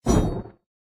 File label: whoosh; Smite; Sobek